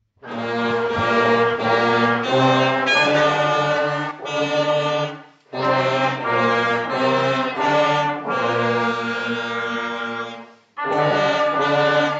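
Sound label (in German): Bläser Donkey Round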